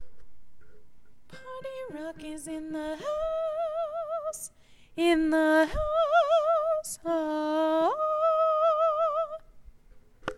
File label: perfect 8ve; Reference songs: Perfect Octave